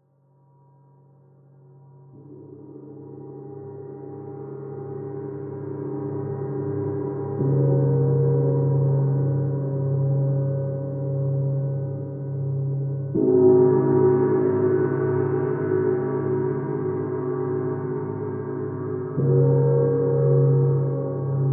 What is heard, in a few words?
gong; kulturelles zentrum